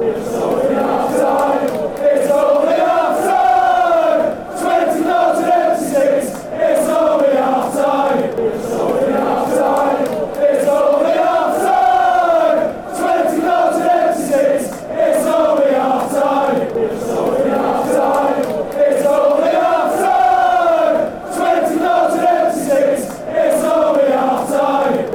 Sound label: its only half time, fanchants; mufc